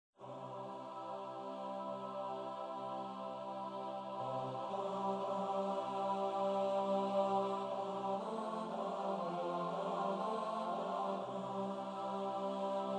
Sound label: Kyrie choir